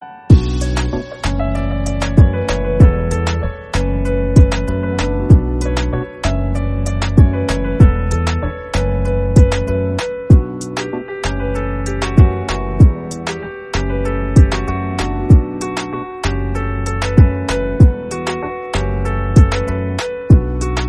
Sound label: music punjabi